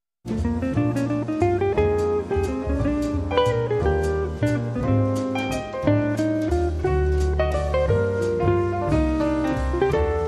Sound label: Guitar Waltz